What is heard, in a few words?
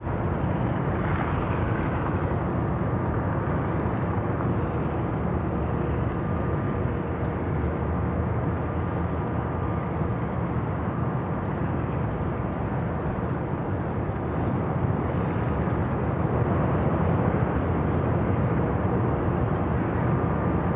Ambient Mine